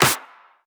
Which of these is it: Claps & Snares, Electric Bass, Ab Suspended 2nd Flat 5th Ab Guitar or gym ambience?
Claps & Snares